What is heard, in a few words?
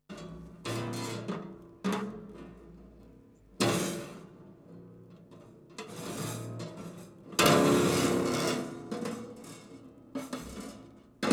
Plier Pluck, Piano; Piano Destruction